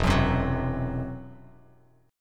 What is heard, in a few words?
E Suspended 2nd Sharp 5th E down Bass Standard; Esus2#5 strummed